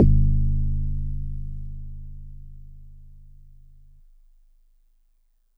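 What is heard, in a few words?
Marimba DX100 G#1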